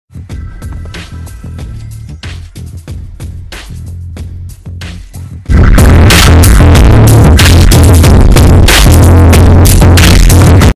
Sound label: dance bass boosted; fortnite